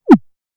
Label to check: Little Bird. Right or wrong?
Right